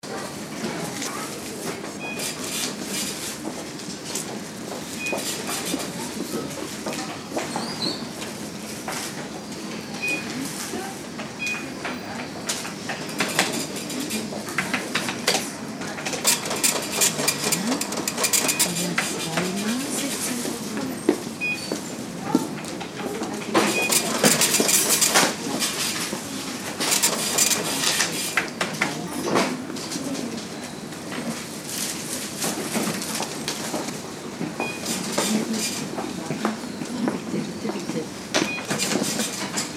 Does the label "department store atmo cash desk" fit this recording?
Yes